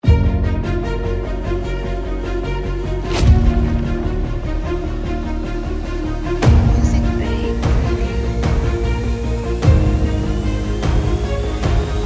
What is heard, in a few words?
Epic Orchestral Trailer